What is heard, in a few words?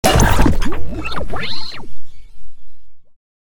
machine hit complex short